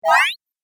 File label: 8 Bit Style Jump; Video Game Sound Effects